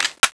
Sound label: clip in; tfa cso; charger5